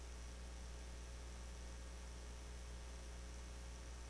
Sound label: swarm